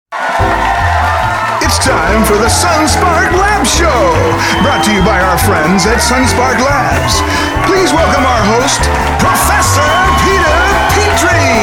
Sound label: Intro Music; SonSpark Labs; Power Pack; Assemblies Resources; Skit Sound Effects